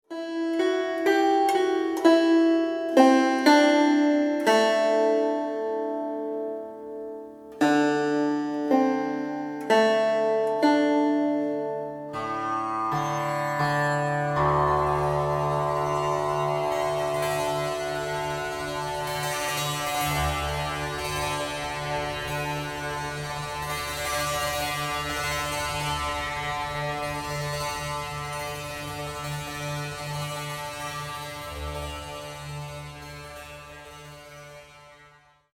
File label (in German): Klang Chordophone